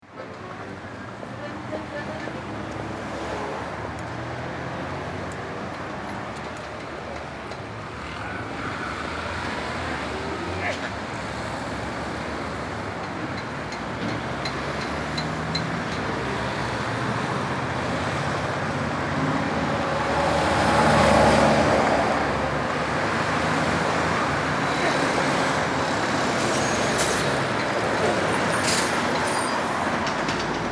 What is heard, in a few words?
City